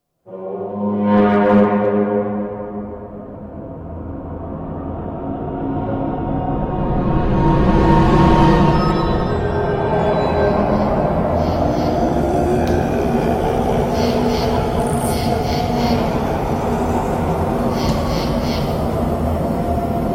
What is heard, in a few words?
spooky ambience